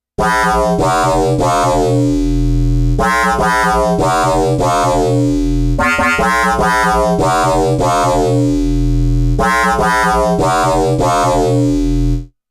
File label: KORG Odds Static Waveshaping